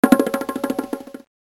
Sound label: long drum